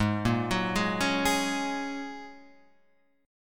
G sharp 7th Suspended 2nd Suspended 4th, Guitar, Standard; G#7sus2sus4 arpeggiated